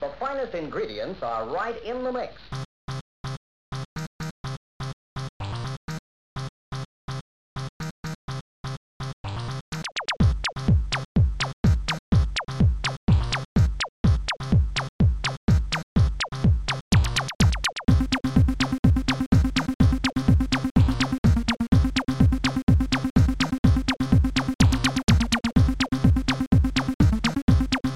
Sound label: secret techno; World Of A1200